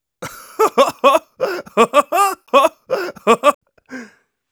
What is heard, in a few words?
Scout laugh happy; [Happy Laughter 2] You got owned!